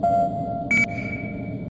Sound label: boost ping; Omega